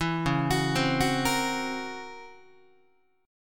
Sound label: C sharp Minor Major 13th C sharp Guitar; C#mM13 arpeggiated; JGuitar